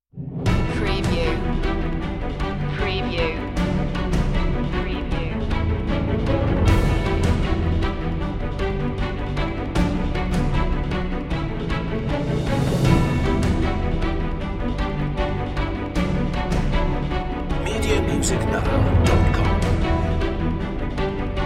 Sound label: shroud of perseverance no choir